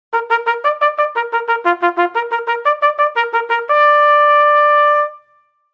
Deploy As Skirmishers, bugle

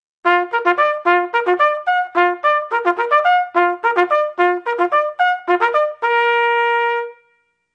Orders for Orderly Sergeants, bugle

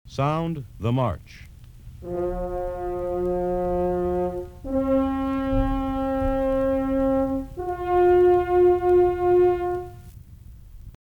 The March Bugle

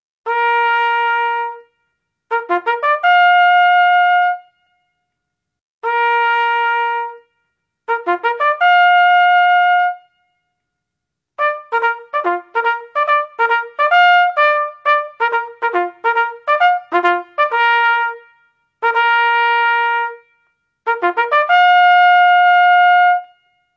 Breakfast Call, bugle

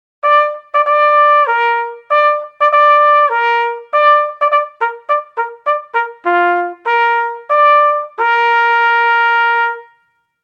Unfix Bayonets, bugle

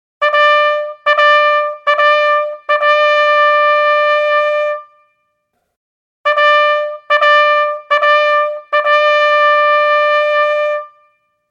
Cease Firing

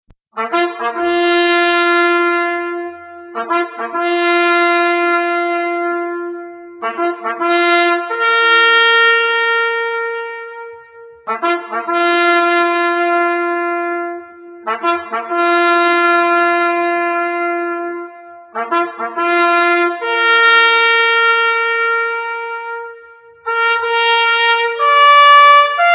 The Rouse

